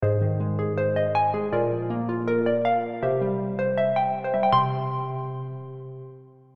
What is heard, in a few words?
Sound Of Joy